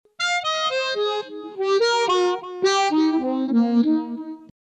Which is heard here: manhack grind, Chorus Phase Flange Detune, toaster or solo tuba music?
Chorus Phase Flange Detune